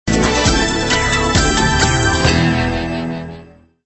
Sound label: BGM Transition; Shop Empire